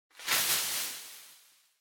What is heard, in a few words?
Throw Streamers Throw Multi